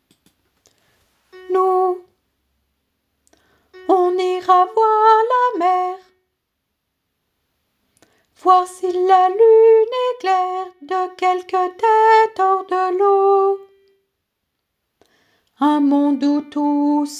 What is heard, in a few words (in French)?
Nous Sopranes